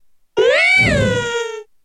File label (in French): Cri helionceau